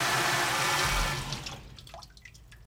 Wash Machine Fill Up

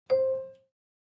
Clavichord Separate; keyboards

